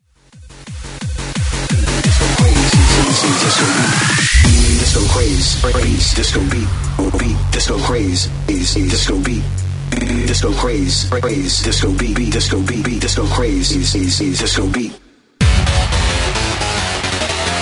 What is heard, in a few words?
disco beat; eufeion; Electronica Exposed